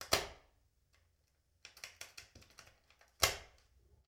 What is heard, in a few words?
Old Light Switch On Toggle Off; Sony Pictures Sound Effects Series; Period Devices